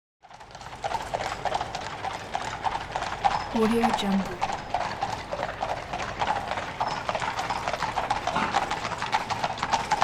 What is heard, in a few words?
horses circle up and past at trot; hollywoodedge